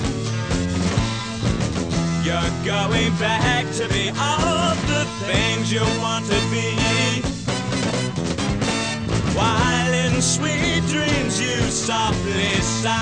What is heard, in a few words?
rock